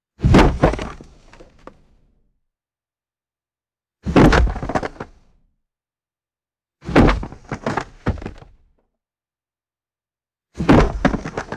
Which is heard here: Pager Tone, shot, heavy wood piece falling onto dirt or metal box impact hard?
heavy wood piece falling onto dirt